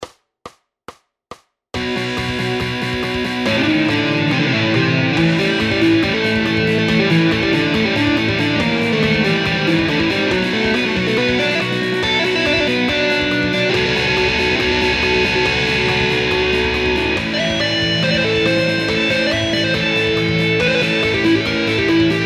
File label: Solo, electric